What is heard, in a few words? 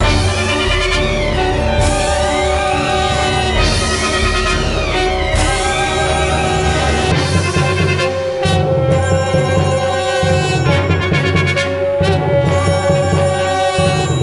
Pirate Battle; Lego Battles 2009 NDS